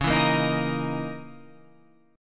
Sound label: C sharp Minor 6th Add 9th C sharp